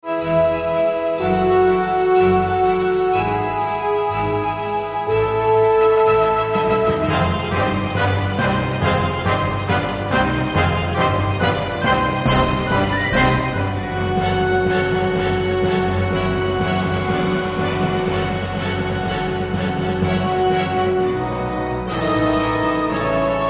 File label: Orchestral